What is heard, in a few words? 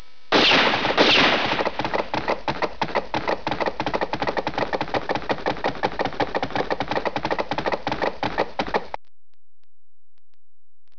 WESTERN